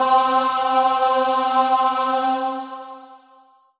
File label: Alesis Sanctuary QCard Choir Aah C4